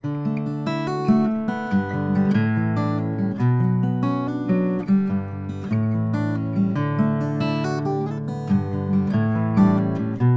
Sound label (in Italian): Arpeggio Acustica; Universal Audio LA610; amplificatore BBQ 2-2